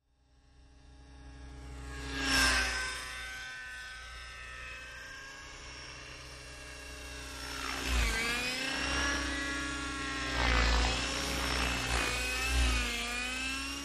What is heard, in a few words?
Rc Heli Doppler Flybys, RC helicopter motor engine servo ext